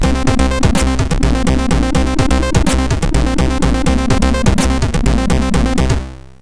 Unused Shmup Ready Game Over; MOS6581R4

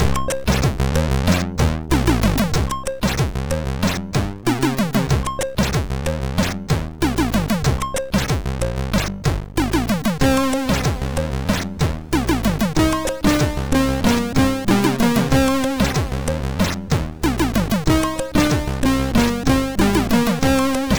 Slow Jam, sid MOS6581R2

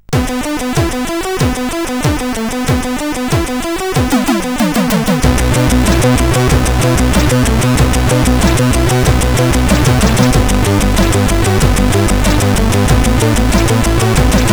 Short Tune, CSG8580R5; sid

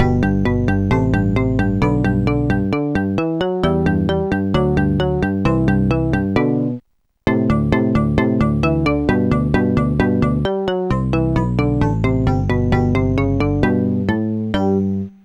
guitar; OctaMED MED; Plain Songs

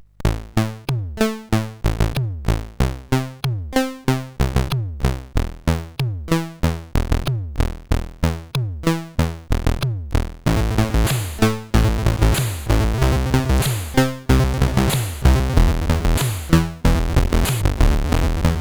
Complex Beat, CSG8580; sid; CSG8580R5

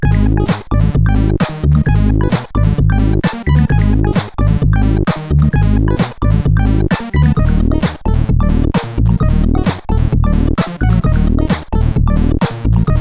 sid CSG8580R5

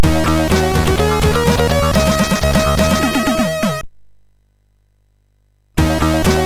sid MOS6581R3; Dolphinforce v3

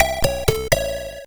sid CSG8580R5

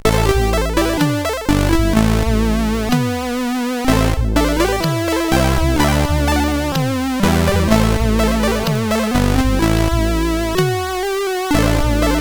Short Tune, CSG8580; sid; CSG8580R5

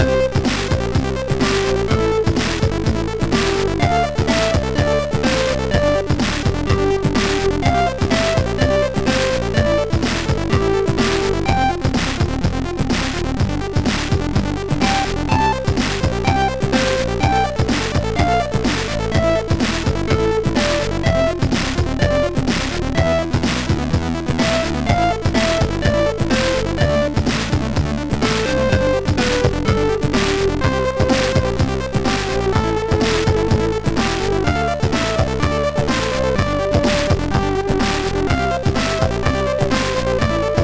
Game Musix, MOS6581; sid; MOS6581R4

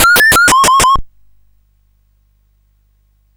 sid CSG8580R5

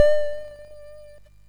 MOS6581R2, sid; Defenders of the Earth

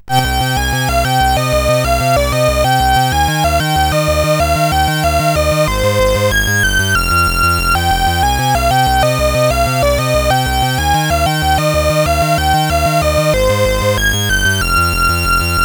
Cambodia sid CSG8580R5

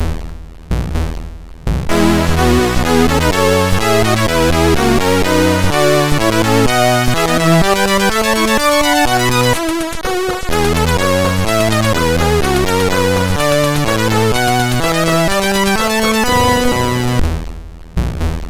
MOS6581R4, sid

